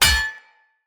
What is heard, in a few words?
Hammer Impact Parry; Weapon Impacts; Player; Combat; Tuvalkane Hammer